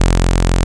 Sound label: SOURCE SAW